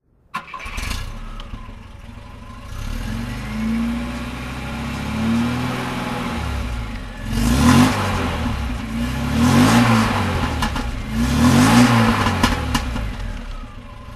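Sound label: volvo p130 b20a throttle, engine sound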